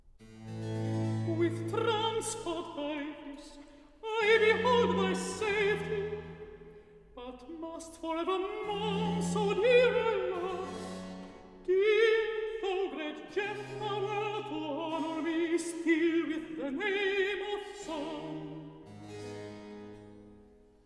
Recitative; (Hamor) With transport, Iphis; Handel Masterworks; Klassika

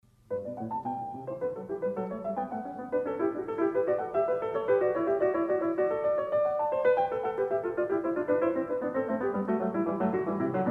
Bach Goldberg Variations

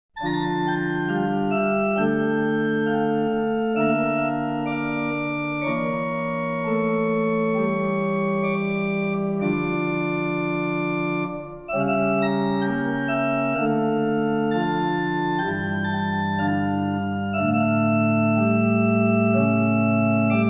Organ Concerto in D minor - Organo ad libitum; Op.7 No.4; Handel Masterworks; Klassika

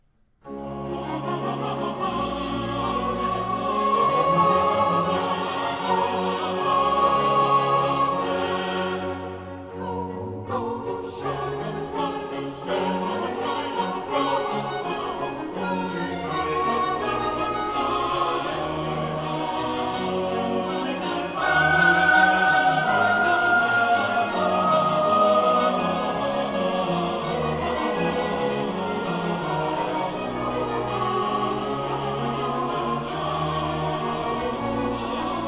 Choral; BWV 61; Nun komm, der Heiden; Amen, Amen!

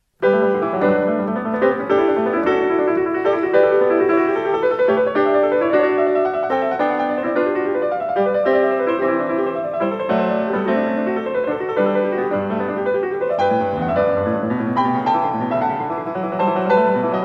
Bach Goldberg Variations

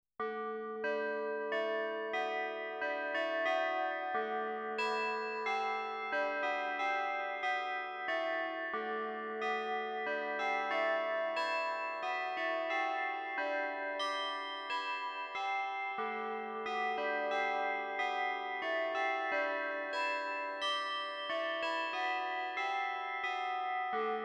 Lithuanian Bell Study

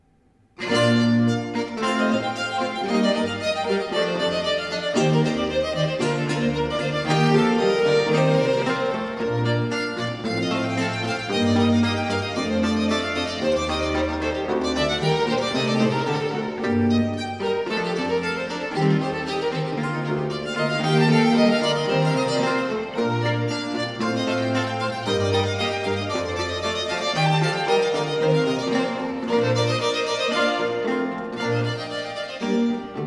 Sonate 8 in C major - 4. Allegro; Arcangelo Corelli; Corelli - Complete Works; Klassika

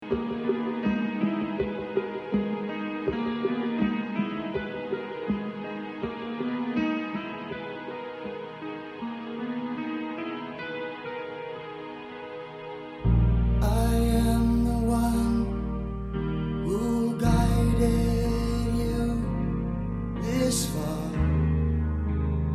Guide vocal

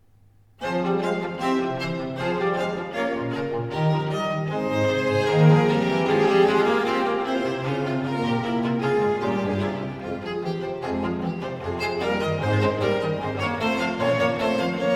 Sonate 11 in G minor - 2. Presto; Arcangelo Corelli; Corelli - Complete Works; Klassika

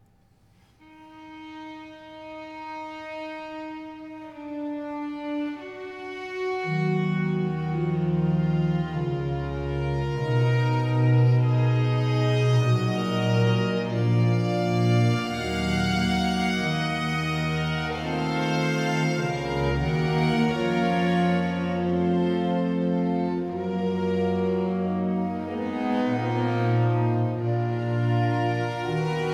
Concerto 3 in C minor 3. Grave; Arcangelo Corelli; Corelli - Complete Works; Klassika

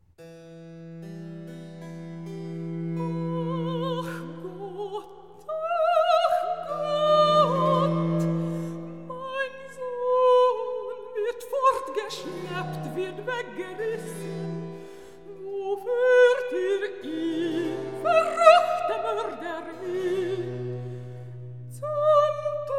Recitativo; Maria Ach Gott, ach Gott!; Klassika; Handel Masterworks

